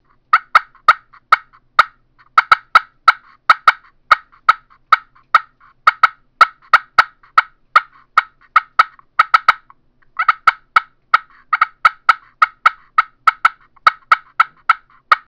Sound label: pro glass cutts, turkey; Southland Sounds